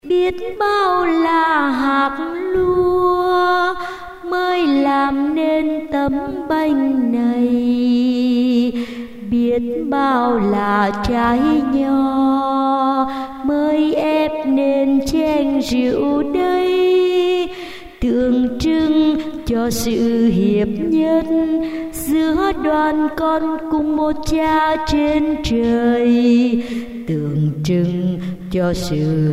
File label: alto